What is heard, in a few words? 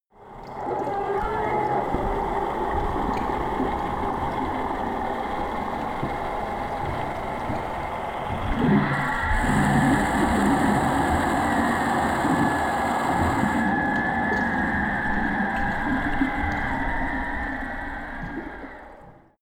water fx